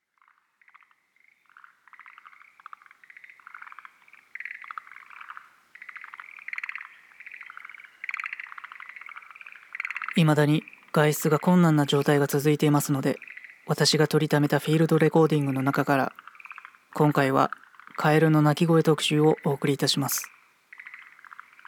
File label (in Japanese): 宮城のカエル特集ナレーション入り